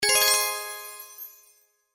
5 Scatters; Pirate Quest

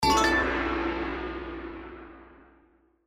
Symbol Regular Win; Kungfu Showdown

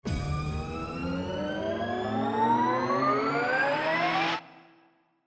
Anticipation Jackpot; Bombo

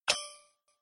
Reel Spin Stop; slots; Christmas Blast

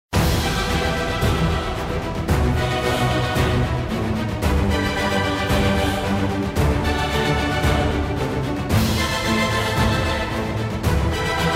free spin BGM; slots; Fortune of Olympus

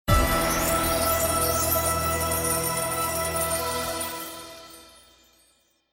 Bigwin; Wealth Gods

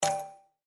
btn rebet, Sicbo

